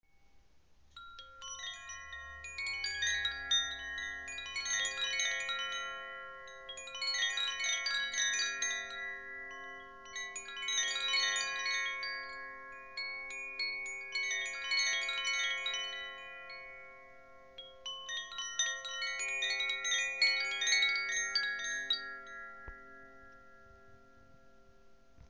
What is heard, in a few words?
rain; carillon energetique serenite